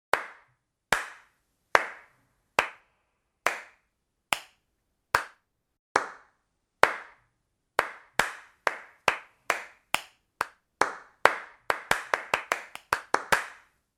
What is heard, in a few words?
CLAP CLAP CLAP